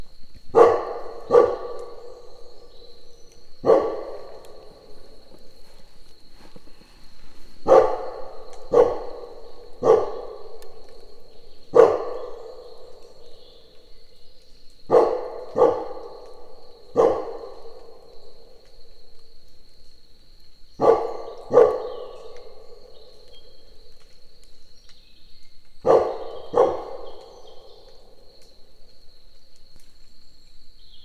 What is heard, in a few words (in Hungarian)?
szundi és a tücskök, kutya; Egerturistaház; termeszetben